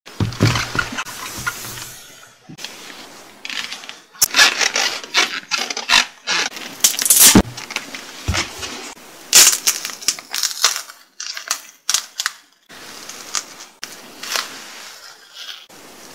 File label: eating bananas